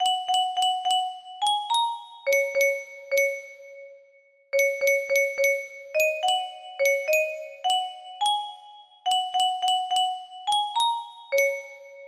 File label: music box